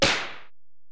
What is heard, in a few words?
EPerc, Alesis DM-PRO